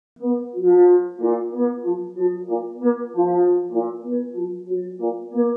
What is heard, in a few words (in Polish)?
Zbiorka naganki